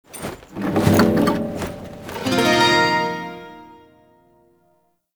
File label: Shawzin Equip; Warframe; Duviri Shawzin